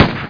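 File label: machine gun; q2; weapons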